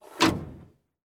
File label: vehicle gearshift; teardown; ps5 windows xbox series xs